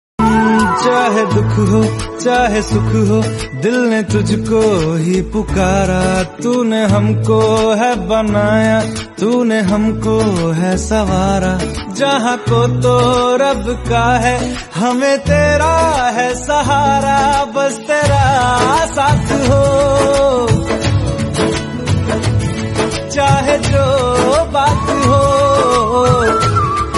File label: anniversary hindi song